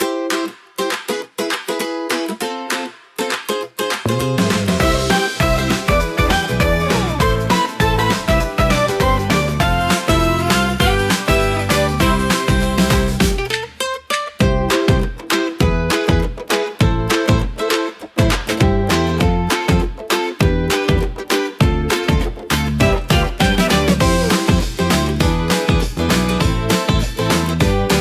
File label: upbeat pop energy soundtrack; happy steps